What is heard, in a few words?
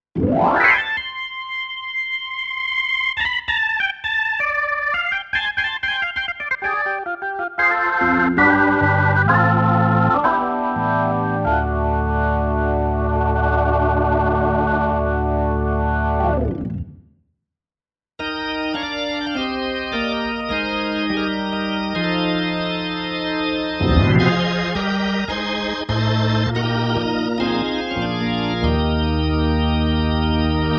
Organ